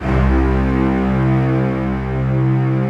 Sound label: STR2SEC C1, ENSMBL; AKAI S-Series CD-ROM Sound Library VOL-8